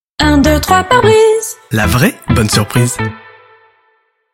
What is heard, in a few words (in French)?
logo sonore; 123 Parebrise